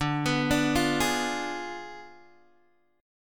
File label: D Diminished 7th D, Guitar, Open G